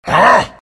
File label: pain; Huskar